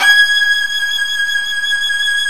G#5, Solo Violin; StereoSoloViolin

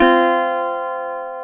GTR, Dobros, National; Club-50 - Foundations Roland

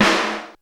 Snare, Korg DSS-1 Drums

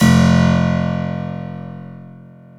KEY HRPCR; Roland - LA Composer vol. 1

